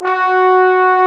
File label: bones, sweet brass